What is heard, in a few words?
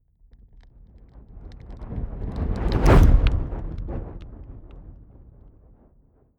fire whoosh crackling long